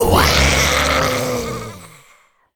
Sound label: deathmetal death; Crypt of the NecroDancer